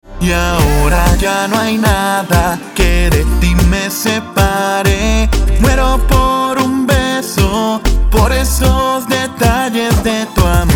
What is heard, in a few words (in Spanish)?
Pop Reggaeton